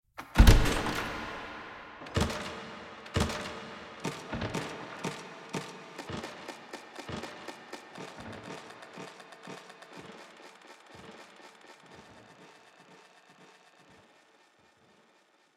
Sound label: Door Glitch; MiSide